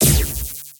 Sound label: Electro Explode; bejeweled 2 deluxe